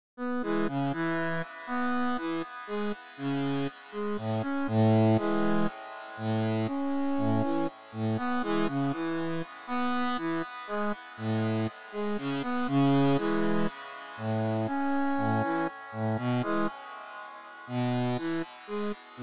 Synth chord

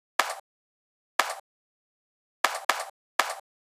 Clap; hydrogen

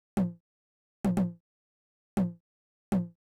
TR909 Tom; hydrogen

